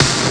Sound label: techno snare long; snare samples; Mecomp Multimedia 1